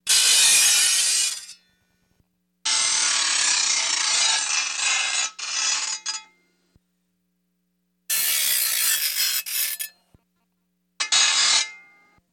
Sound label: Metal Grinding, grinders